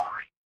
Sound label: cartoon boing